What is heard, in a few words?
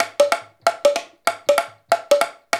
93 MANJA, African Ethnic Drums; Spectrasonics; Supreme Beats